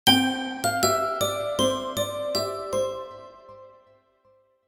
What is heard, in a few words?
Christmas Notification Ringtone